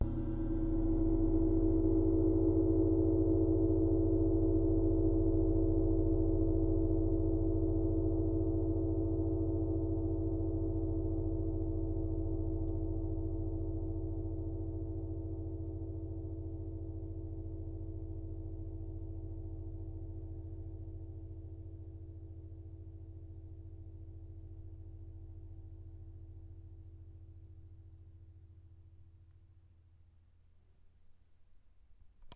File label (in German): Tam Tam Einmal